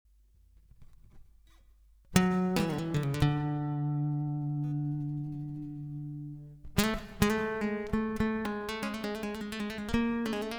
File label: Collage